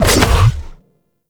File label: bite cast; Warframe; Voruna